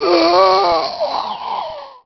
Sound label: zombie idle, male